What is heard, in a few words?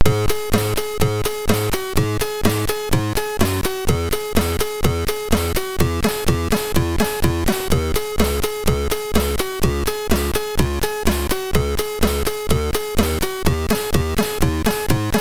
sid, MOS6581R3; hvsc